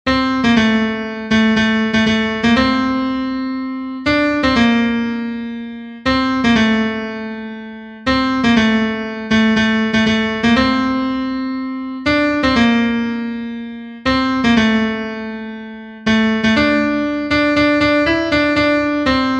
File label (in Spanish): TENOR, himnos en piano; cantos del camino